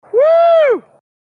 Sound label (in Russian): vostorg